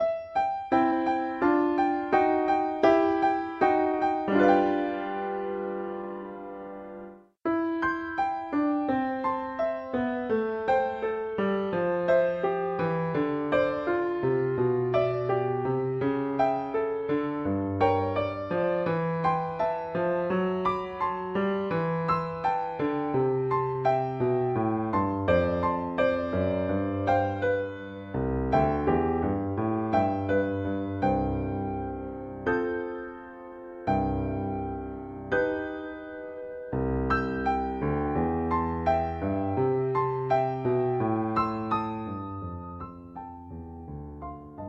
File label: pf